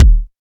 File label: present kick drum single shot D sharp key